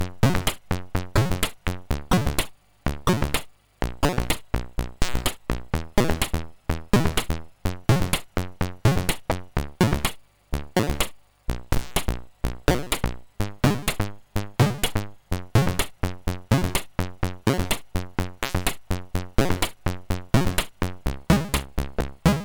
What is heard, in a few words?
Electronic 256 bytes, MOS6581R3